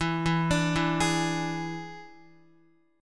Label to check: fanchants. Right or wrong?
Wrong